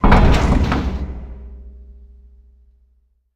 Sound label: Floor Low Button down